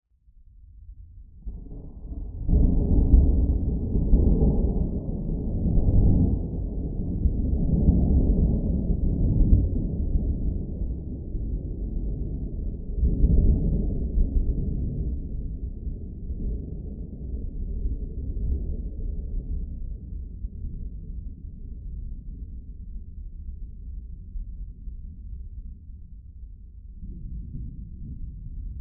Thunder High Thud; Thunders

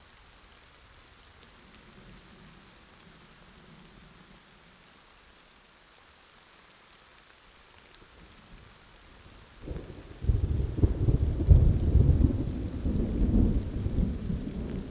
Rain Rising With Thunder

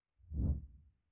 Whoosh Fast Thud; Whooshes

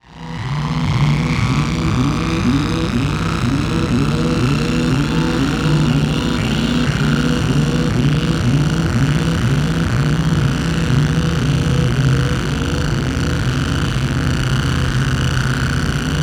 Distortion Drone

